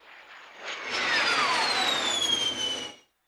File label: MIG Sweetener, Aircraft; Sony Pictures Sound Effects Series; Vehicles